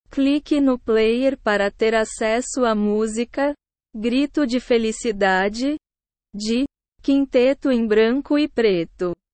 grito de felicidade

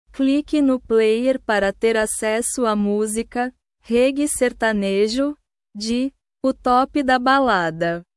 reggae sertanejo

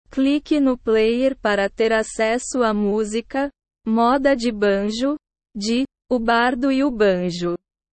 moda de banjo

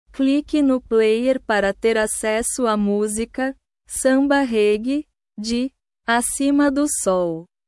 samba reggae

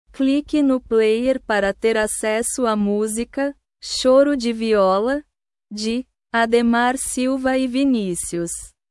choro de viola

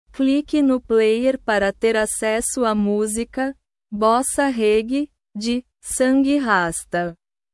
bossa reggae

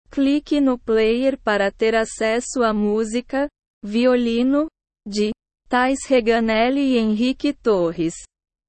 violino

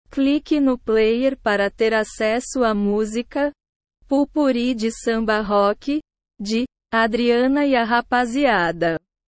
pout-pourri de samba rock

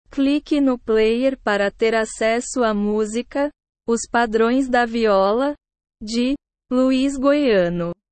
os padroes da viola

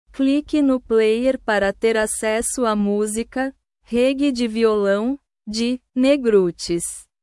reggae de violao

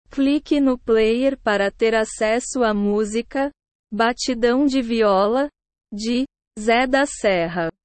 batidao de viola

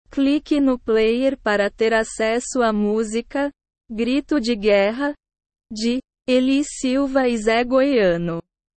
grito de guerra

